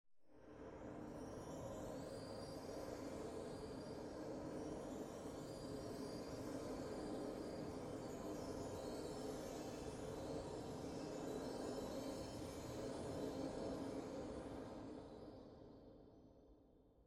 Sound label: Terrain Gen Standby; Desert SFX